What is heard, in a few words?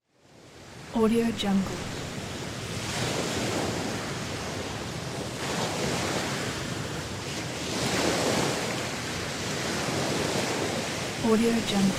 ocean shore